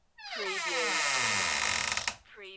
Door hinge squeak; beanstalk audio

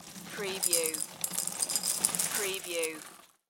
Cereal Pour Into Bowl; sound effects bible

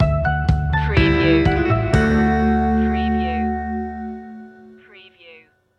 starting something new stinger

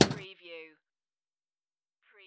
Pinball sound, clunk

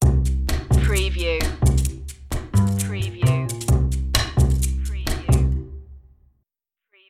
jazzy opener underscore

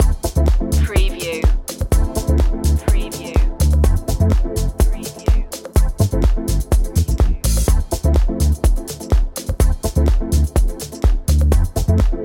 groove loop

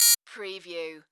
Beep Modulated Single; sound-effects-bible

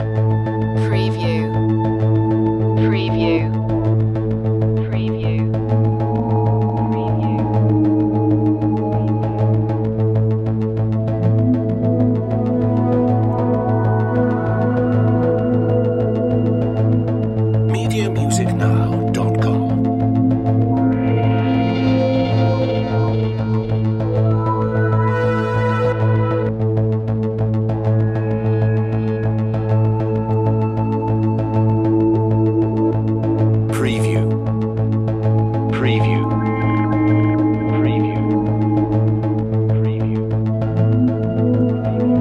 build the tension

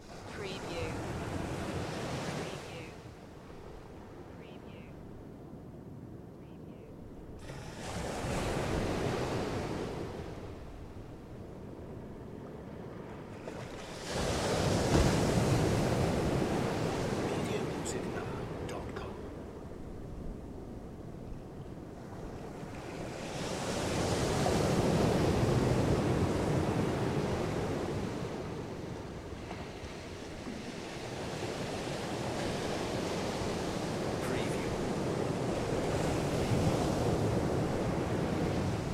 Crashing Waves Loop; beanstalk audio

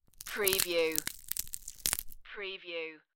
tiny crunchy bite

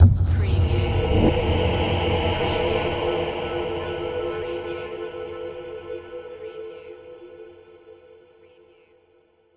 Hit Vox

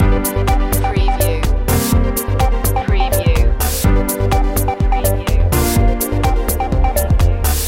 groovy background loop

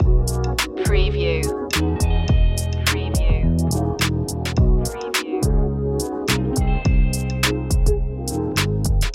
loop

